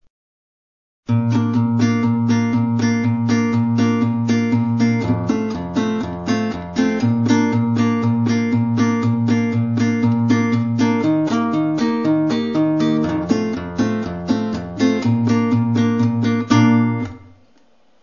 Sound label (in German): gitarren begl, volksmusik bass